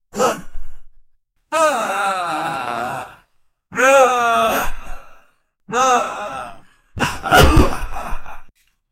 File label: some one is following som, chase